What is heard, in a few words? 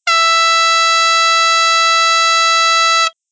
Rail Horn; 660